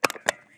Button push

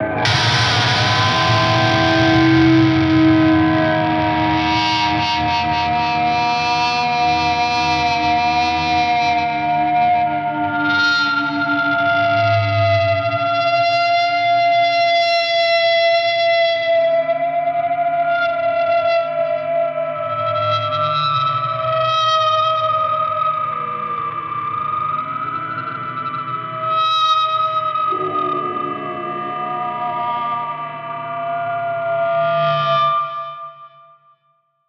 Random Guitar Feedback

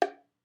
Rim

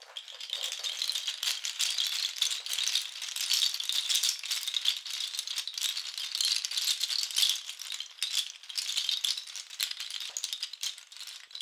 Bean Belt Shaker Random Diddling

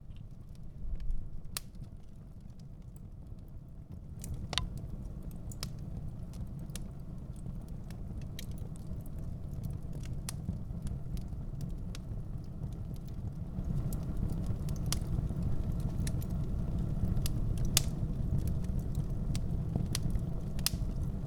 Fireplace Fire

